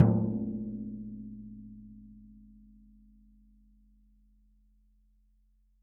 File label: Metamembrane 8cm outside